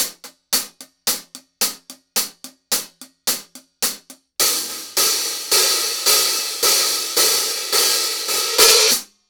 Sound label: X-Series Hi-Hats